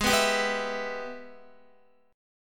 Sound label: Ab Minor Major 7th Sharp 5th, down, Guitar; strummed; JGuitar